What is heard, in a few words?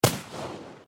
flintlock